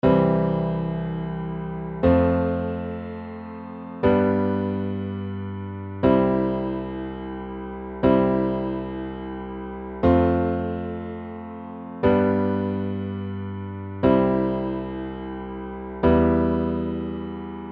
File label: chord